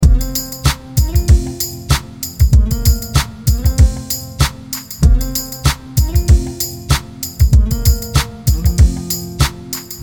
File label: full loop